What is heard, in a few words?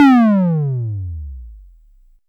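SYNTOM MD P, analog toms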